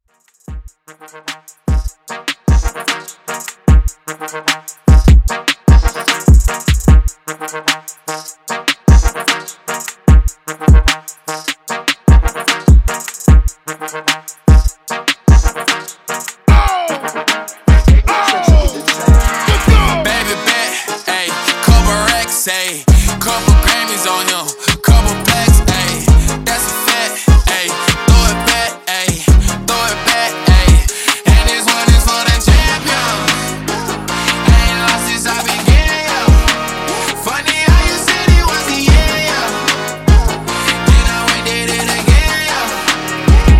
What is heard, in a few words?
HipHop Redrum 150Bpm